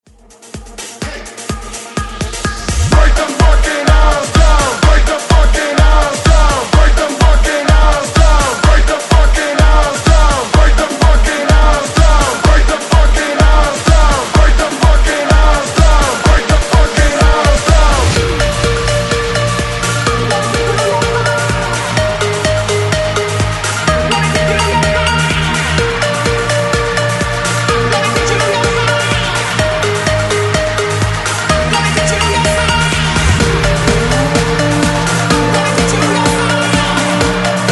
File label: extended 126 Bpm; DJDOLLS